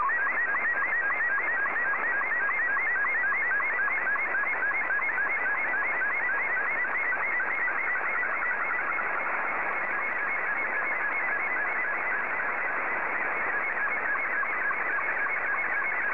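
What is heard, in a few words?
Scottie 2, slow-scan television; ZL2BMH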